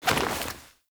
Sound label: Generic Air Roll Whoosh; Warframe; Characters